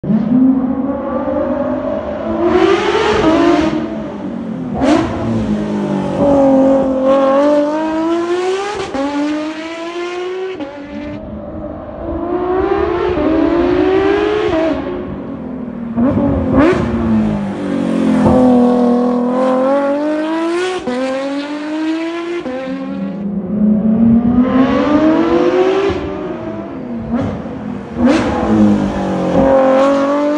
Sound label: 992 gt3rs r1 motorsport rsr exhaust; world s first and best sounding